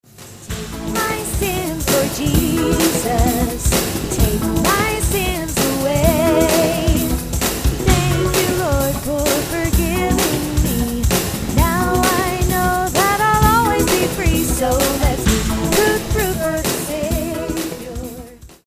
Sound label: Baseball Song